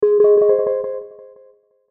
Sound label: Logo Soft Filter Delay Pan; Sonic Logo Sound Effects; Production Element Imaging Element Accent Transition